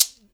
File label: MARACA, Percussion; InVision Interactive; Percussion Slam; BOLERO